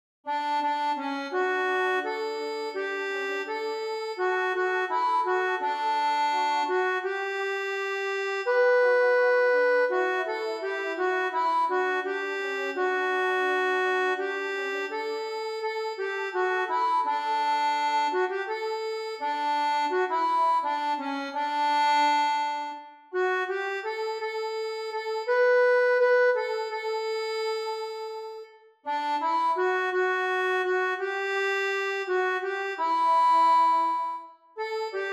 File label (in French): ACCORDEON; CelesteJerusalem